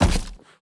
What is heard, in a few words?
fly endo walk; steps; the monster plane; kolmods